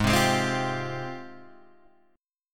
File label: G sharp Major 13th, Guitar, Standard; G#M13; strummed; 4,3,6,5,6,3; down; capo=3